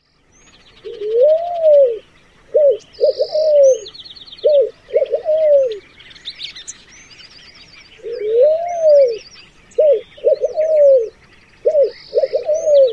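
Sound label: red-billed pigeon cooing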